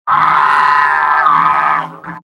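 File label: Pyro pain critical death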